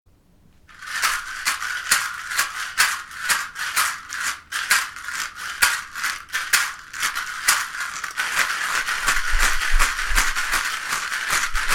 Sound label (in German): rassel, schamanische rasseln